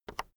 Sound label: keyboard external enter release soft